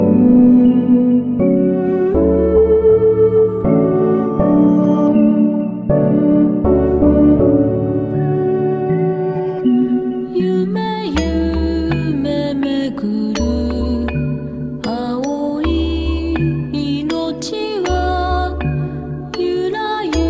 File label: Lullaby; Meru